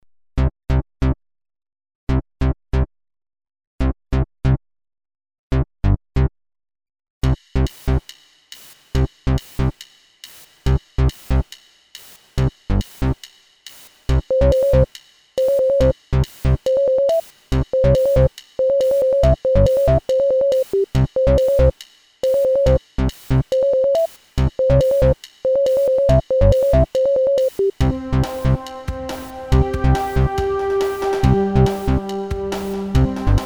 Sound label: Industrial Sheep; Sheep Goes Left